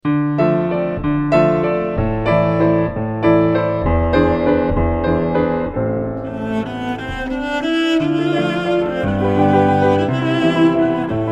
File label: instrumental